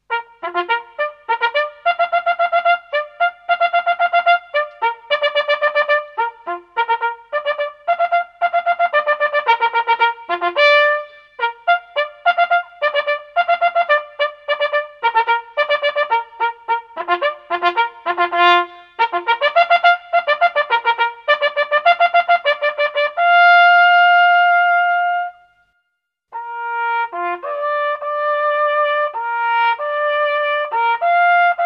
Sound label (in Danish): støder på jagthornet